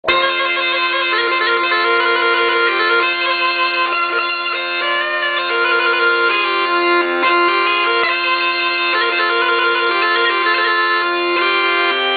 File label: bagpipes juh